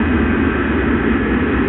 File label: jet, rumble; golgotha